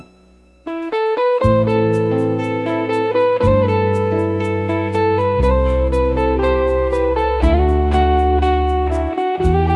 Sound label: guitar solo, bella ciao; musiclm; melody conditioning